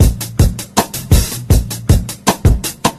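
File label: wood blocks, drum loops, boombap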